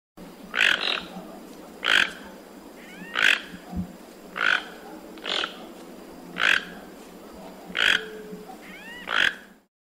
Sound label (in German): tukan; zootiere erkennen